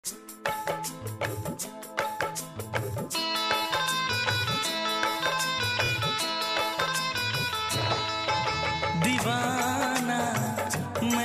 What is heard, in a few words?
hindi ringtone